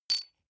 medium metal impact medium chink

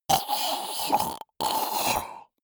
monster choking zombie dying

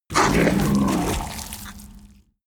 kraken growl hiss; kreaturen

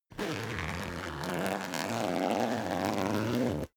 zipper down jacket open slow; objekte; reissverschluss

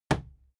fight impact sweetener cloth; nahkampf; soundpool

